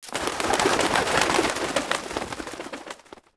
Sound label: Birds Scatter; Sid Meier's Civilization 4 Complete; Warlords